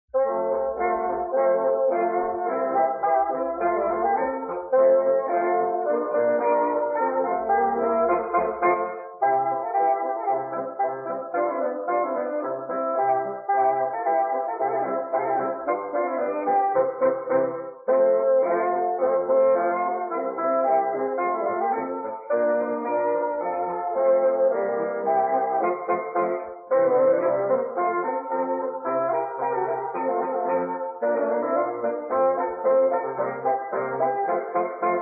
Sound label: Polka, bassoon quartet